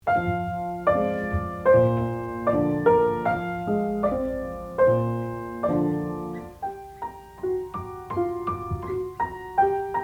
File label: piano